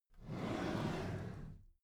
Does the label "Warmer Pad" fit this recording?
No